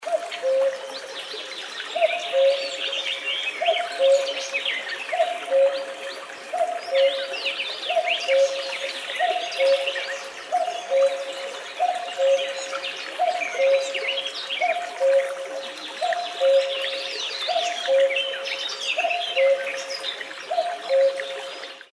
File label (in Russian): kukushka